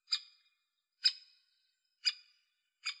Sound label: Dendrocopos major